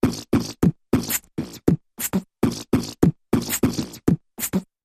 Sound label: Big Mouth Percussion Variation